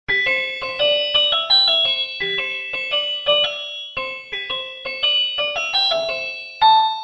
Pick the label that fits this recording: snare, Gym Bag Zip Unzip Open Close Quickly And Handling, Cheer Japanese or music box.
music box